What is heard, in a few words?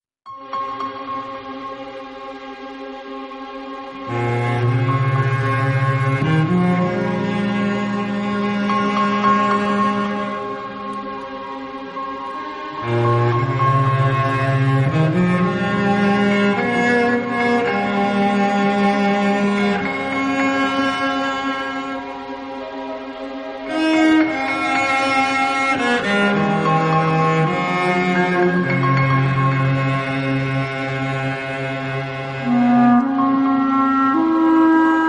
CELLO CHINA FLOW